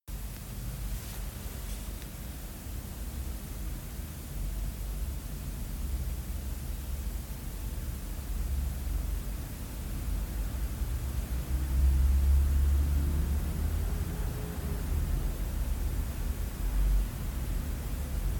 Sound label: low frequency traffic noise sample